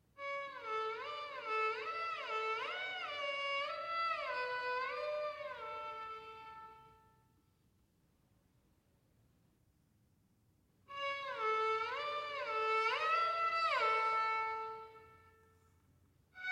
Crochet Equals 130 Like Whistling Squiggles in the Air, Loose